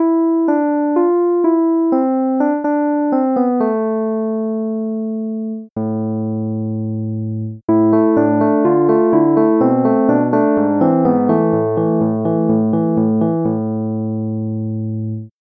rhodes ep; SpectMorph Evaluation #4

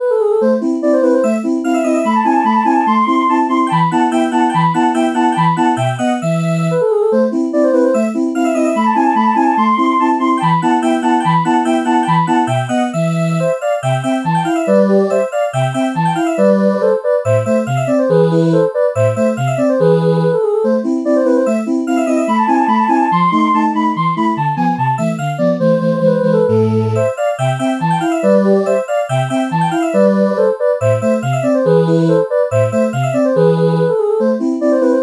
space voice

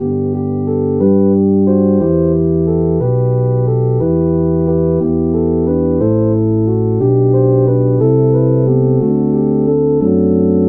tuba

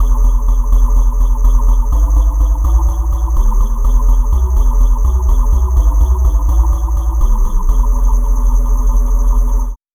bottle chiff bass

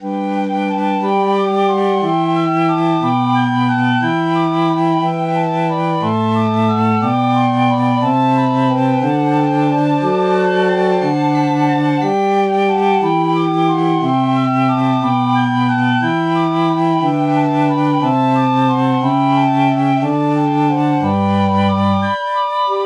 piccolo

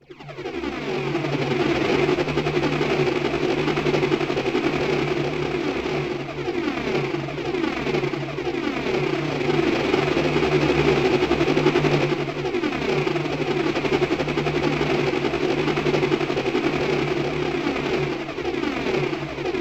helicopter

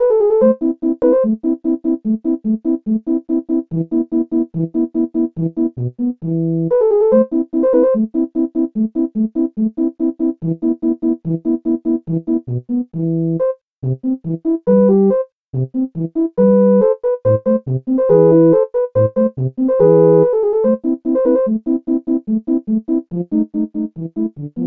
tuba

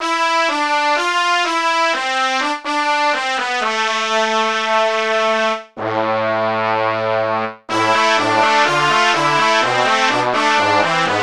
brass section

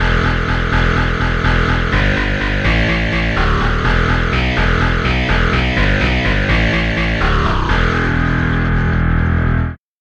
distortion guitar bass

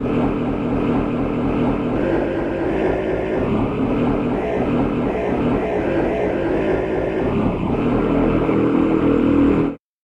ahh choir bass

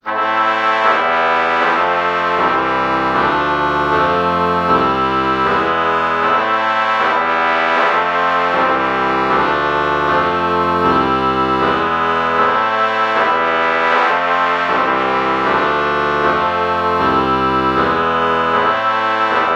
oboe trumpet lpc false chord morph

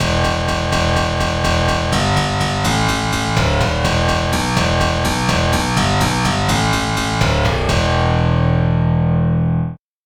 harpsichord bass; SpectMorph Evaluation #4

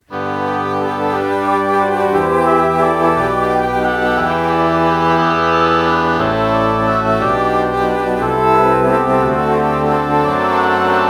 alto flute oboe morph

